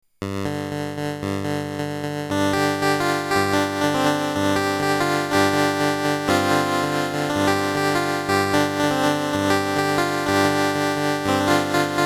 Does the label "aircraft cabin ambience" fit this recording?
No